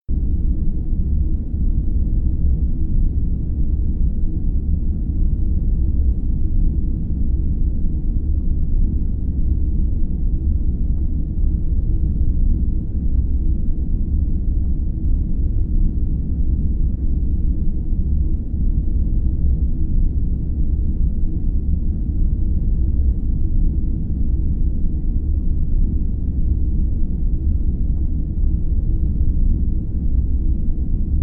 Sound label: Ambient Engine Noise Idling; Star Trek TNG